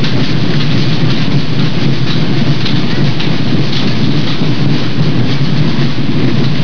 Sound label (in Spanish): tambor; moratalla; Fiestas